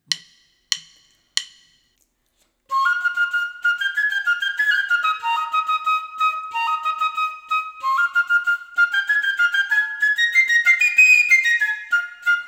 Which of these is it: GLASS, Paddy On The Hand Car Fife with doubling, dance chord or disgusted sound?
Paddy On The Hand Car Fife with doubling